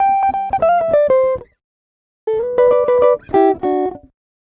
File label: Acoustic Guitar 99 bpm G, African Guitars; Quazibeat; African Secret